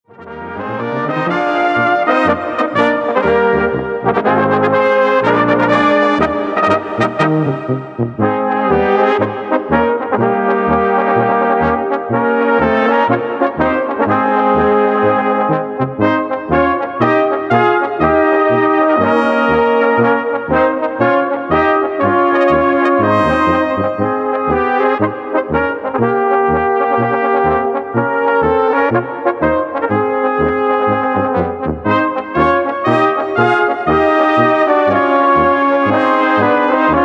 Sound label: Polka